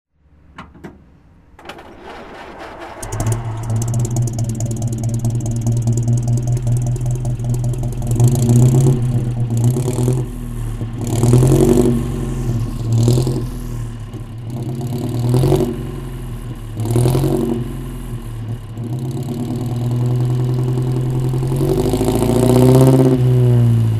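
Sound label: lagonda 2L continental throttle, Engine sound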